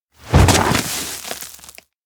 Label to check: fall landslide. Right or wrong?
Right